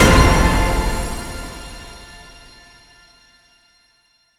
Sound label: big Win End